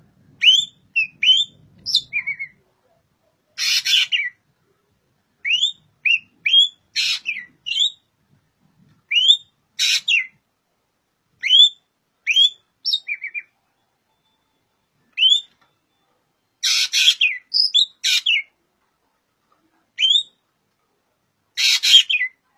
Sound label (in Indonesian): Suara Burung Ekek